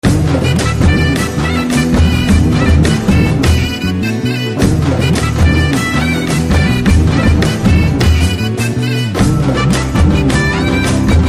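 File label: background music ringtone; Master; Telugu; Chitti Story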